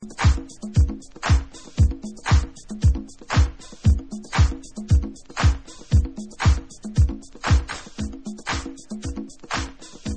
bass bonus

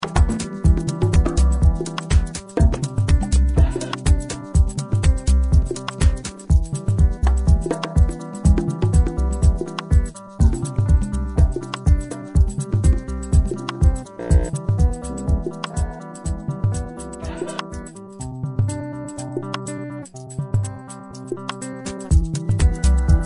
lonely guitar

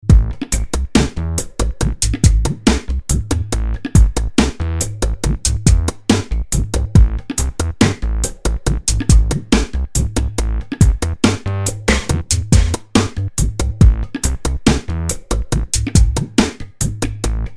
Instrumental

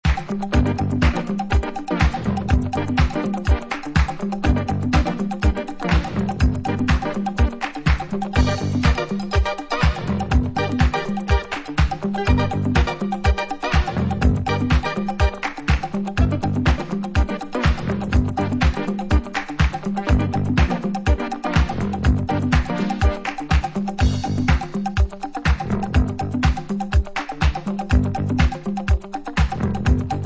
instrumental dub version; disco

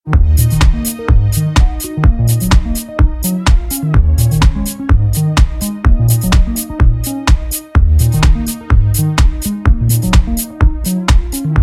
afrofunk rhythm

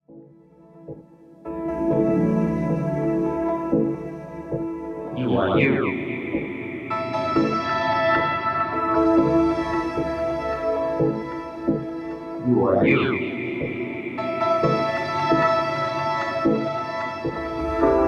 Piano Piano; Chapelle XIV Music

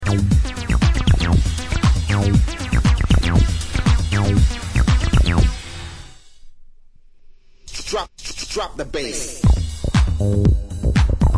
bass drop

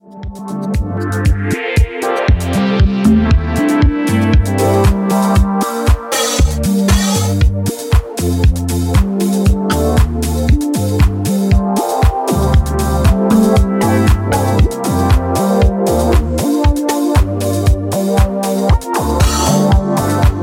Percussion Mix

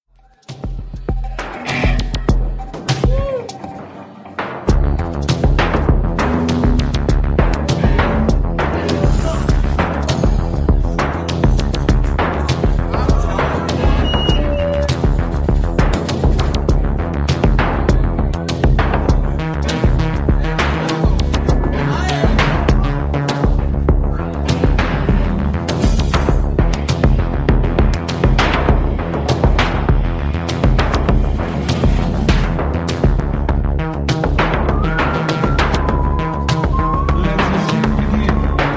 Hum

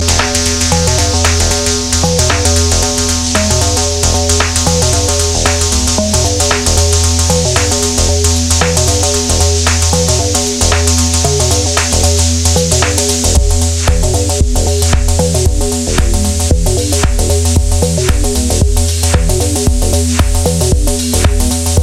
drone logic

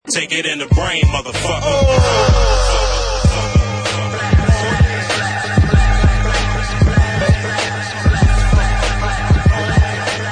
computer rock

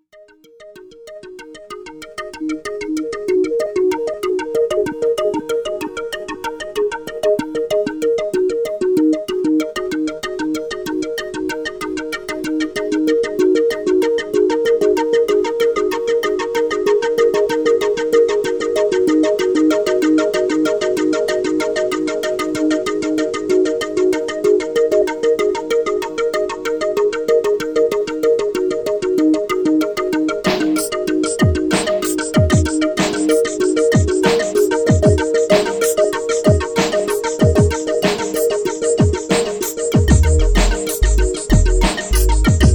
New Room Bass

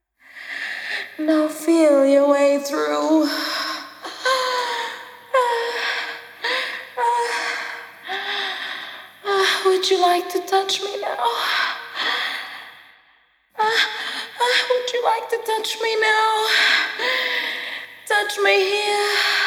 (Acappella)

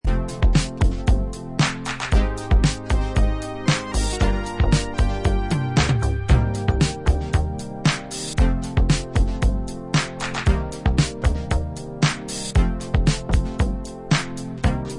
super breaker (instrumental); Funk

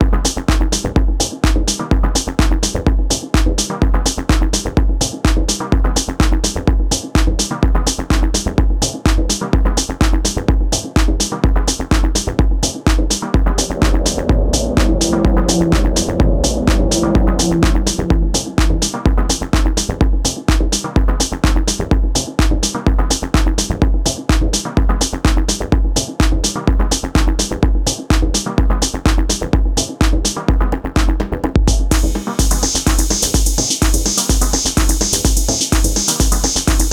BONGO BONGO BONGO

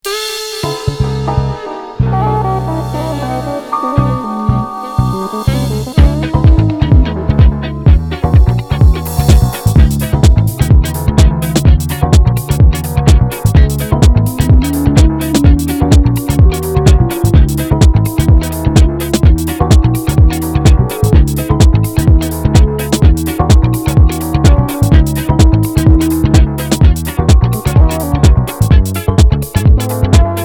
moog instrumental

